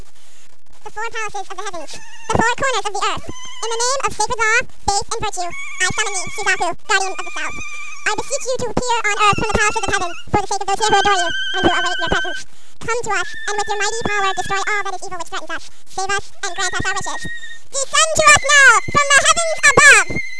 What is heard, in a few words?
chipmunks